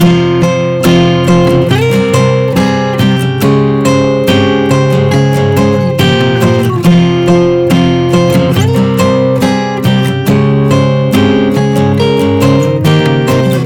140 BPM Fm

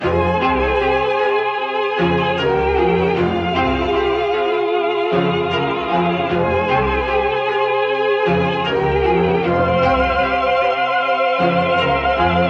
153 BPM Fm

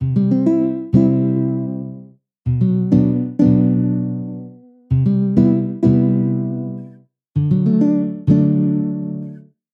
Hybrid Standard Dancehall Afrobeats; midi wizard

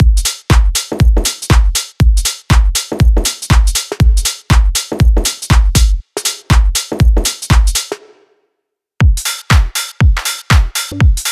House Deep House

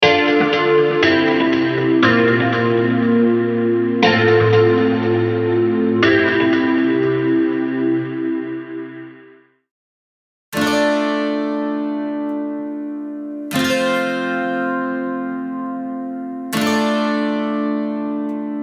Chords Country

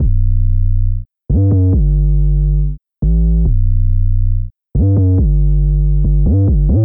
UK Drill UK Grime

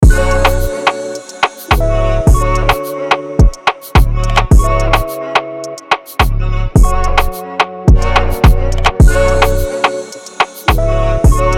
107 BPM Bm; UNISON DEMO; No Evil

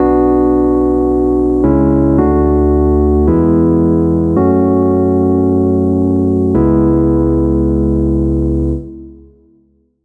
F Major Prog

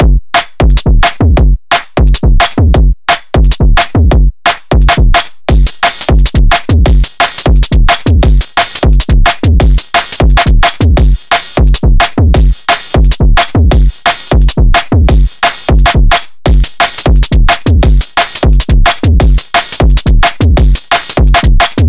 175 BPM

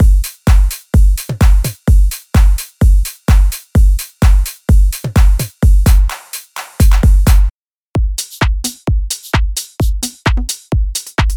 Drum Big Room Progressive House; Drum Monkey; Unison MIDI Wizard Plug-In; Drum Demo

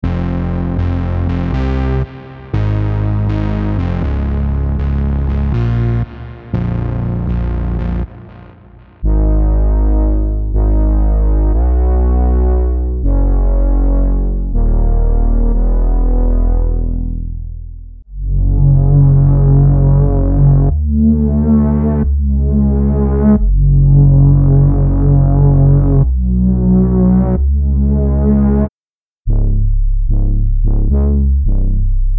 Ambient Downtempo

